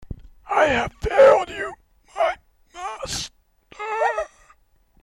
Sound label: death